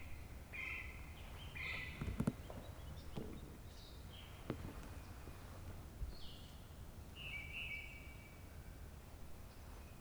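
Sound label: possum bient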